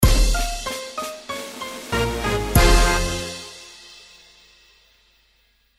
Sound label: sting